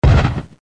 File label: CANON, Guns; PaxImp